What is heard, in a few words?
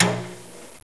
click, Robots; Gunlok